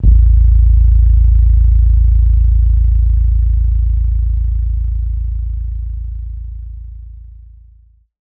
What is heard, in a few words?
A 27.5hz sub hit; daily samples